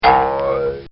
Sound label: Spring Bong; Funny Musical; recorded in a custom built sound booth; Bobby Cole